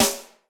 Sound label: Snare, Yamaha RY20